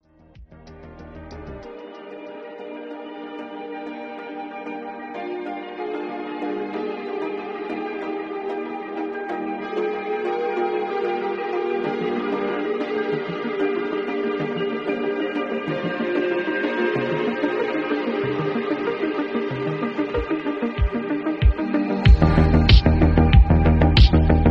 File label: Chill Synthwave Mix